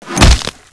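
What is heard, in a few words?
pipe wrench big hit body; svencoop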